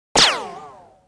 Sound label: ricochet; gesource; physics; impacts; ricochets